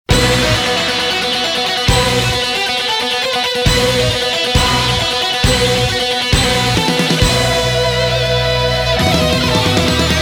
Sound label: Battle Furious SYNTH loop